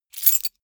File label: key ring large place hard